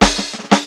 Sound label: perc jazz, Drums, One Shots; Percs; Slate; SD AURORA Sample Pack